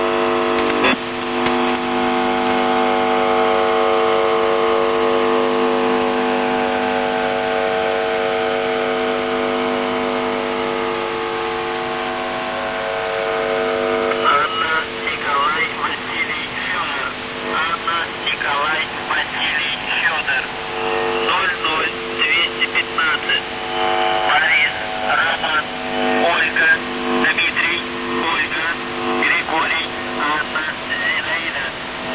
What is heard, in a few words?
buzzer usb msg